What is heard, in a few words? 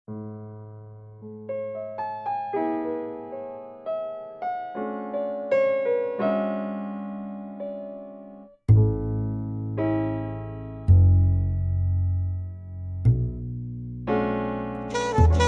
Coffee House Smooth Jazz